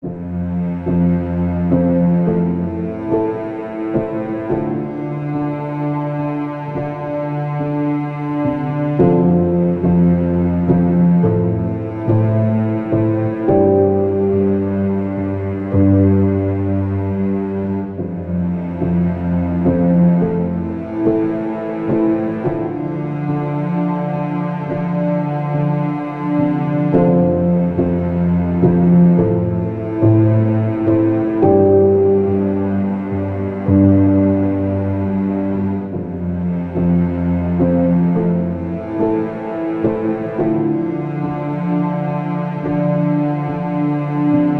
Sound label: Relaxing Piano Strings Underscore